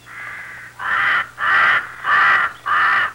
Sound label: crow; Animals